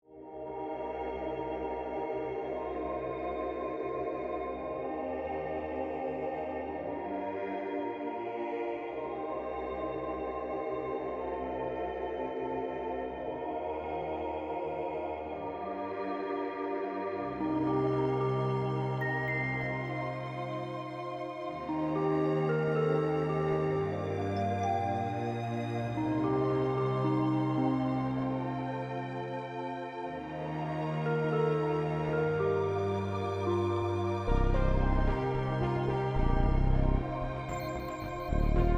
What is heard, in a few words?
Scoreboard; bejeweled blitz